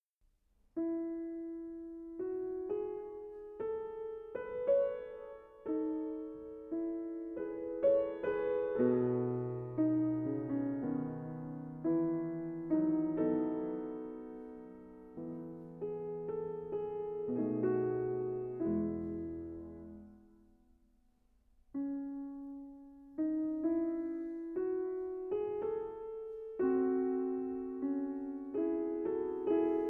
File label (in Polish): Chopin koncerty single piano